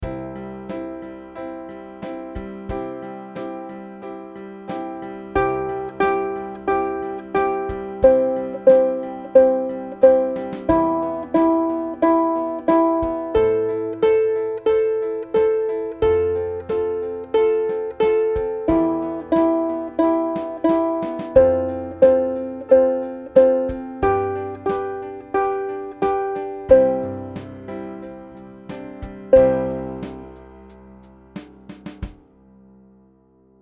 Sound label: Down and Back Up Again SLOW Tempo WITH UKULELE; Rainbow Ukulele